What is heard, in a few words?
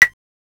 Percs